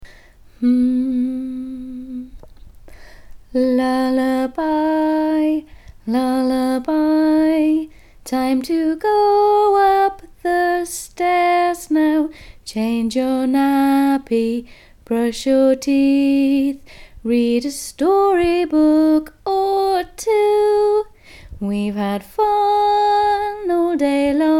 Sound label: Lullaby; Brahms